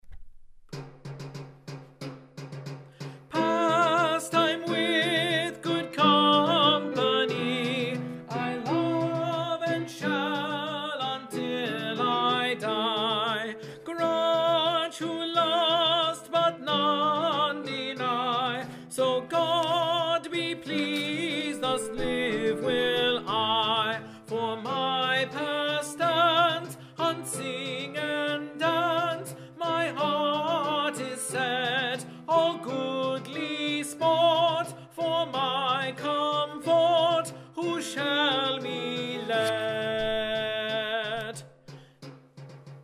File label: Tenor; Pastyme with Good Companye, King Henry VIII